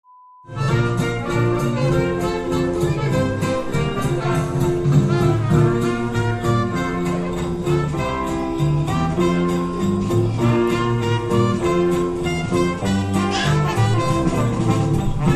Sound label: Christmas Band